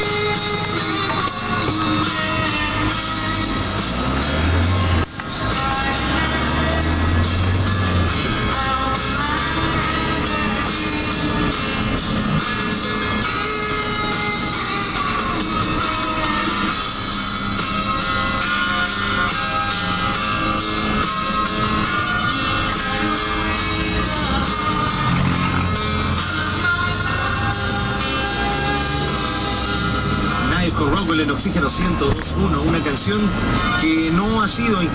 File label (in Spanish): sonido colectivo